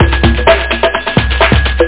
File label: jingle; bsnake